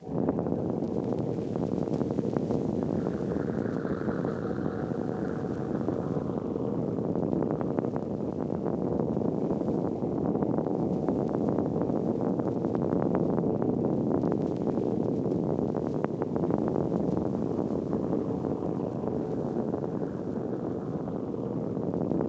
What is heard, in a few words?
Noise - Ski Wind; ESW Ear Candy Noises